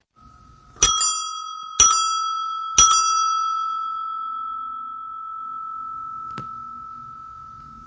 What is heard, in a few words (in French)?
sonnette